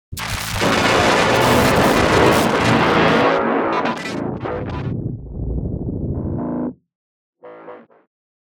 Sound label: loud glitchy noise